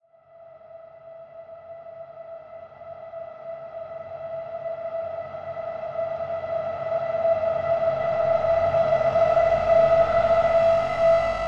Metal scrape

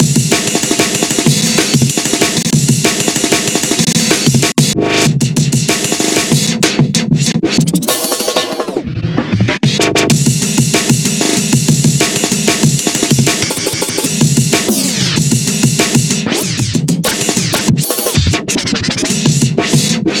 Crazy Amen 190

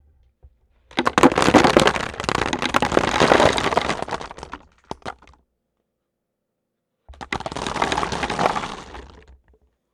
Falling Nuts

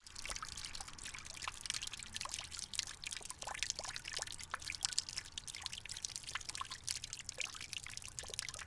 Water Dripping

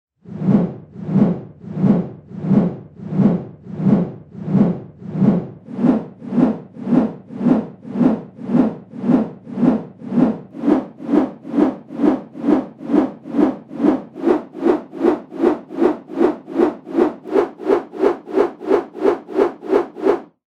Slow Helicopter

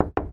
Knock Knock

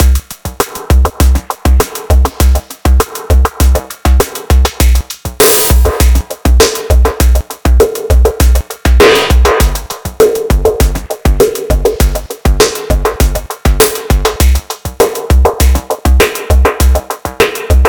Groove 100bpm

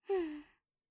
Hmm Little Boy Girl

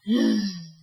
Sigh

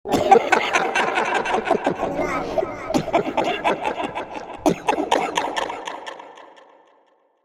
Evil Maniac Crazy Baddy Cheesy Laughter; Joker

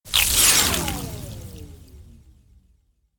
Freeze Ray Spell

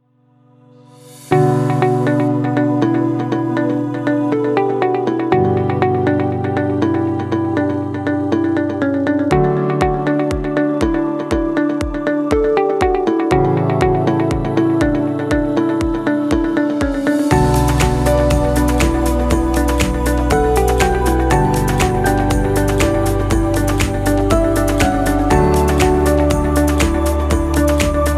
Soft Corporate

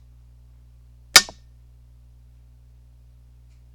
Claw Hitting Glass

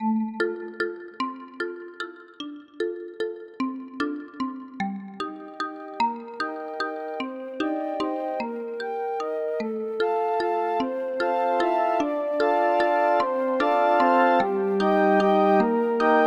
Sweet Waltz

